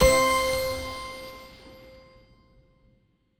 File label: small win; spinsane; netent